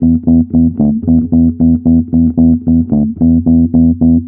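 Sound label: BASS 1, 112 bpm, Funk; USB Soundscan vol.41; AKAI; Roots Funk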